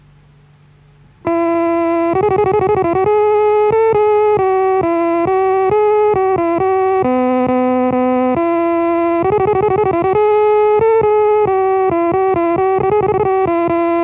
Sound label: Trumpet Voluntary